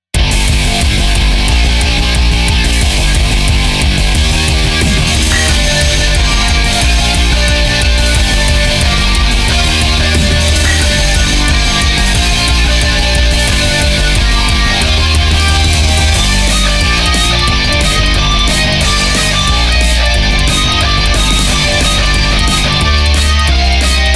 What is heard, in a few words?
speed-star, ショート; ロック